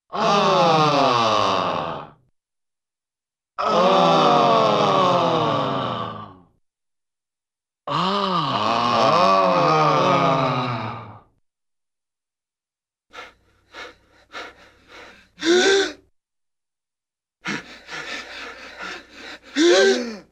Small Group Of Men 'ahhh' Pant Tiredly Gasp 'huh', Cartoon; Hollywood Edge; Cartoon Trax